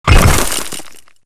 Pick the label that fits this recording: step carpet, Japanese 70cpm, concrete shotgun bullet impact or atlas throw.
concrete shotgun bullet impact